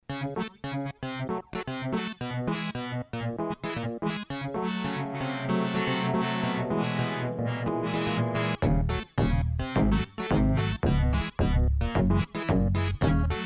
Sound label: Merengue; Soulsa; Freeboards